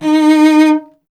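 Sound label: strings cello D#; Miroslav Vitous; Solo Instruments VOL-1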